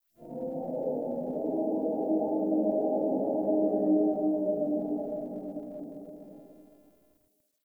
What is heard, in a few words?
Exterior Distant Calls Scared; Ambience; Warframe; Duviri